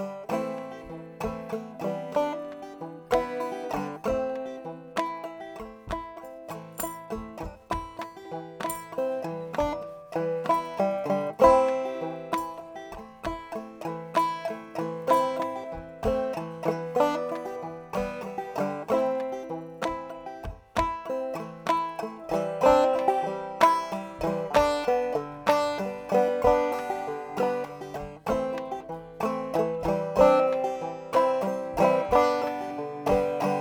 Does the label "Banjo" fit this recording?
Yes